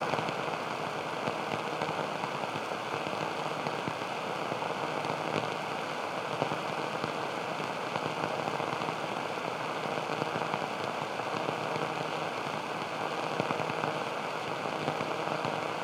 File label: Radio Empty Frequency